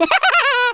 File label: laugh, Brummie; 1997 MMX LAN PACK (win 95); Worms Armageddon